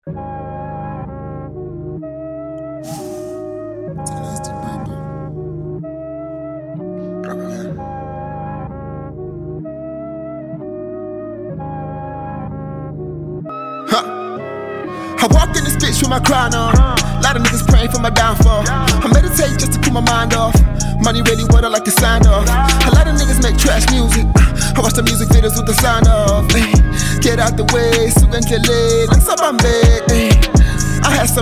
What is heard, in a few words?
Freestyle